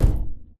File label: Footstep Jog Far; Titanfall 2